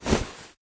footstep cape; smite; Apollo

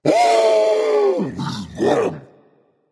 yay berserker; cuchulainn

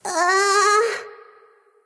nv confused; cupid

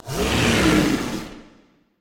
death roar, manticore; smite

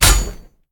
Impact; smite; god susano

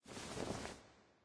angelic victory; Smite; Thanatos

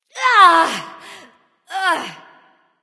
ouch; smite; nike

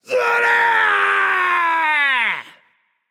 battlecry; danzaburou

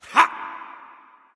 grunt; smite; guan yu

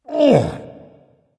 grunt lift dragon; fafnir

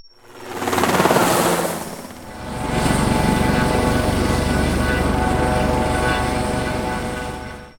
landing; Smite; Zhong Kui; commando

